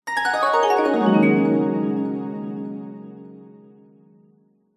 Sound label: fantasy magic glissando warm harp mallet bright descending; zapsplat